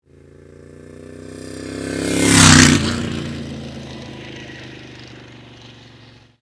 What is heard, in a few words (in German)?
guzzi vorbei